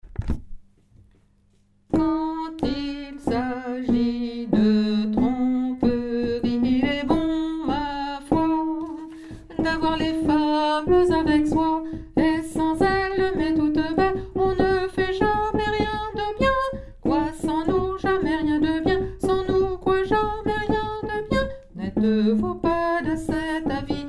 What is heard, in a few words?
alto